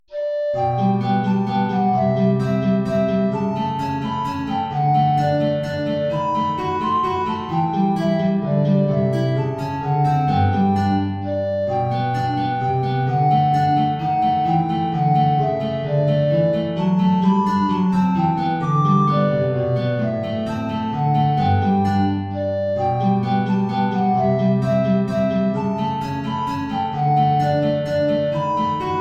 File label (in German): Altflöte & Gitarre; tiefe Notierung; We Wish You a Merry Christmas